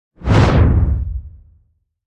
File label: Transit Wipe; Ninja Tracks